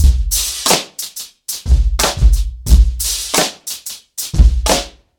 90 bpm drum beat c sharp key, kick tuned to the C# note